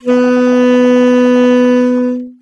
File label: saxo